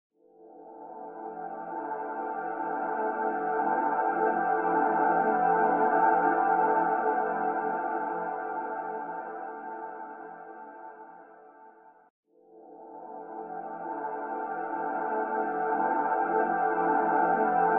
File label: Music